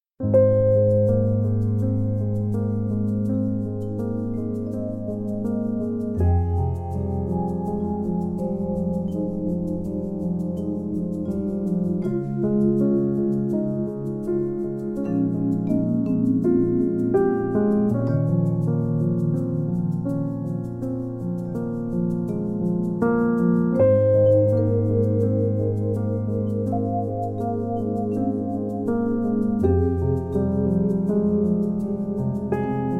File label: Background Score